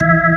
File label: ORG, Organs